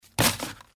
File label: trash plastic